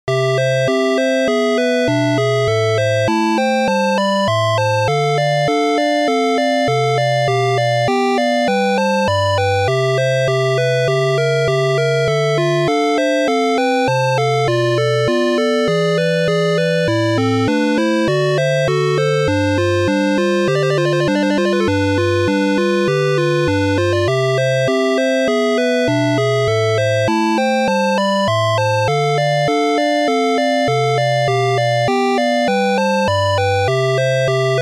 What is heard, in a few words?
Famicom